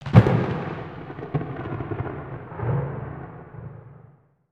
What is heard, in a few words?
Wing Lightning, ambience; Ninjago